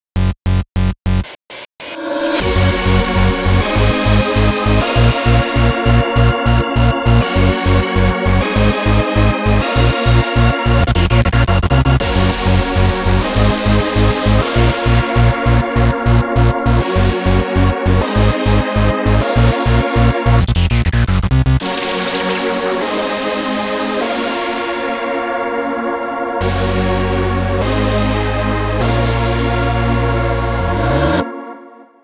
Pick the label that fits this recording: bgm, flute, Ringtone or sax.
bgm